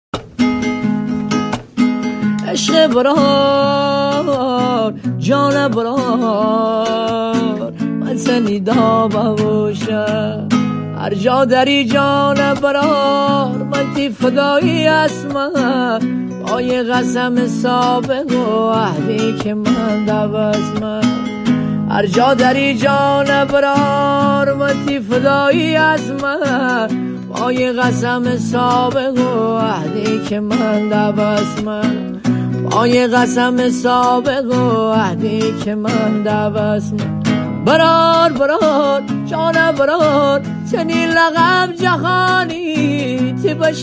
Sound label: Guitar